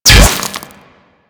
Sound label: Bolt Impact Stone; Warframe; Weapons; Cross Bolt